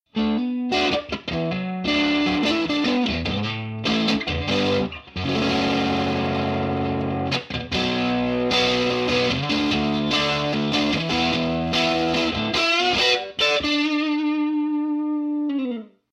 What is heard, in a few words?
overdriven